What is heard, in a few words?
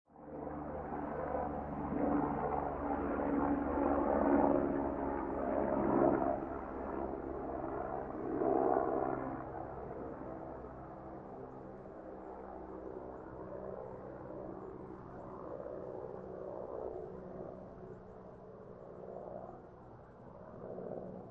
Helicopter Fly By And Away, Transportation Aircraft, Helicopter, Fly By And Away, Overhead Perspective